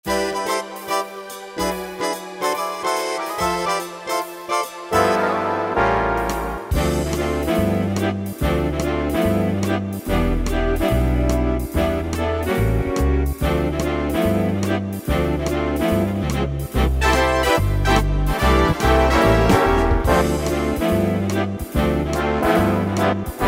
Orch Big Band, Yamaha PSR-3000; Factory Demos

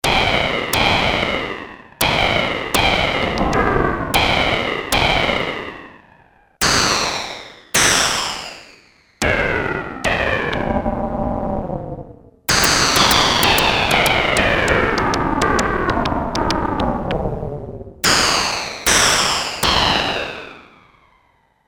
Percussive noise, Sequential Circuits Six-Trak; Factory Programs

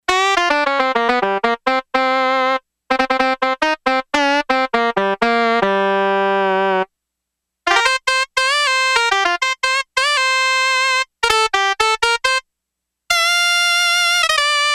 Sax, Yamaha DX11; Factory Preset Voices

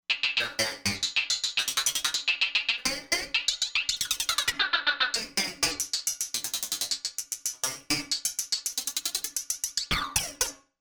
Broken Arp

